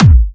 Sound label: Clubby Kicks, Bassdrums